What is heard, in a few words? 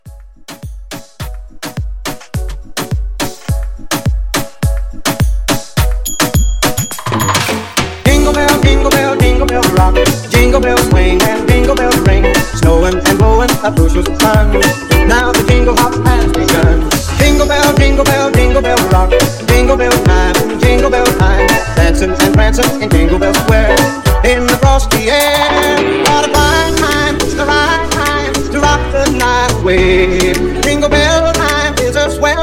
Moomba 105; Quick Flip Mashup